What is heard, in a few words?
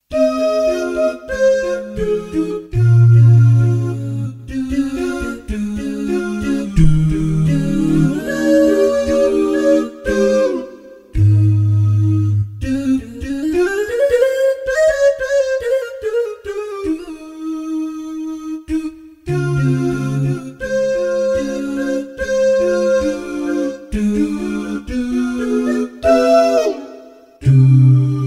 synthy